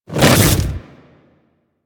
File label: Melee Swipe; Warframe; Infested; Grey Strain; Splitter